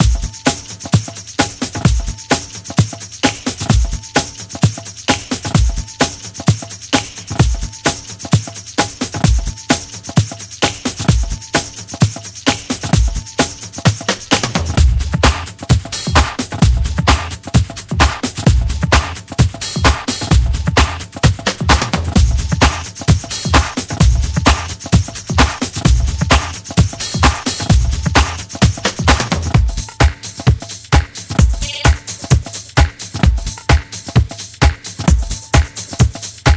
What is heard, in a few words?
Percussion